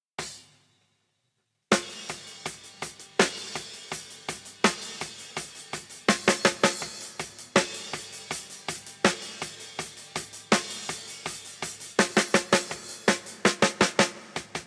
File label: drums